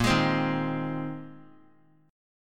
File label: A sharp 9th Suspended 4th A sharp; strummed; 22,27,27,25; chord; JGuitar